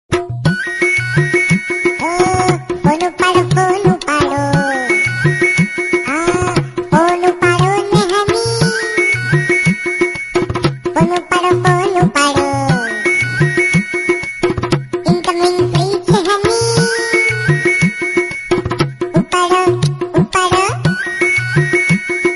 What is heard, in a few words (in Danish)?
Nokia telefon, ringetone